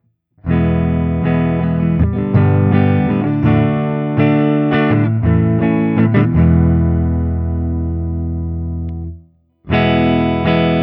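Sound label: Guild Starfire I SC ODS100 HB Open, guitar; Guild Newark St Starfire SC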